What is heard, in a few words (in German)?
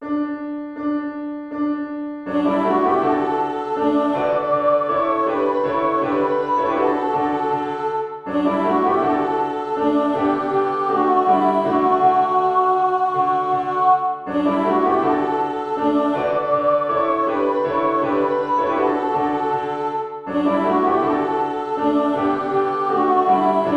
sopran; franziskuschor